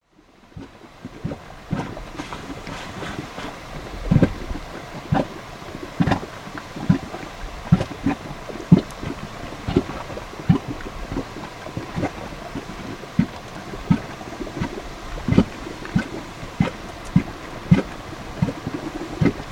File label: paint pots